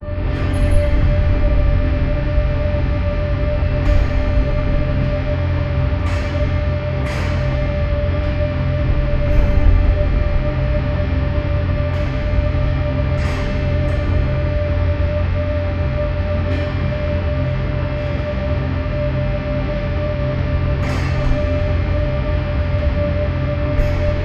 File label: cinematic background musi; anger